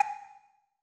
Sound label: arcade back